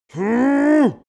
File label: male exert; menschen; koerpergeraeusche; soundpool